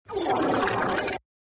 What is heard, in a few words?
fart meme sound better and louder